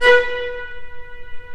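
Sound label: Viola B3; Digital Sound Factory Virtuoso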